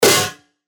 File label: Snare; Wingman; revealed claps snares vol 2